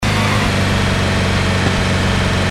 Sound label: turbo, vehicles truck; garrysmod